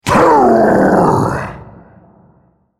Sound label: ultimate effort; Hecarim